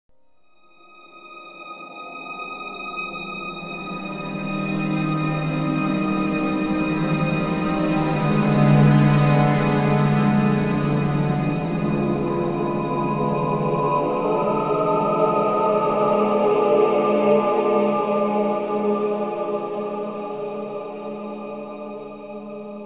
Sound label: Super Amb, music; The Da Vinci Code; XBOX